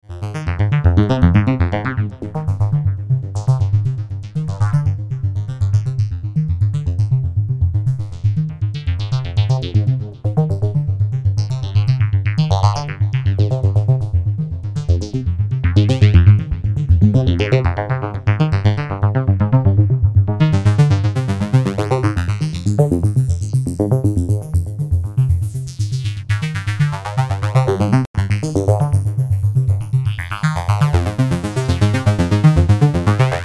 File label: cs80 midi solo